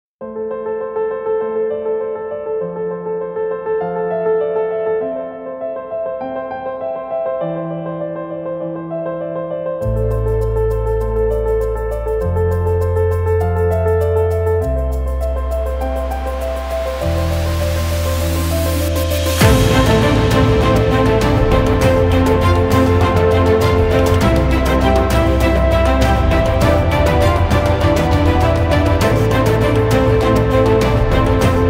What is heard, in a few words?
Epic Background Music For Videos Trailers